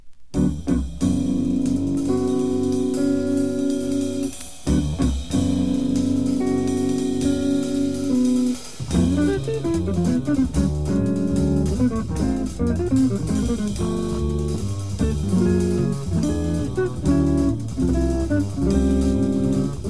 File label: samba